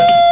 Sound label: beep